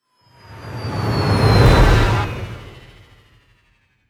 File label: flyby